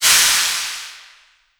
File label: Noise Kick Noise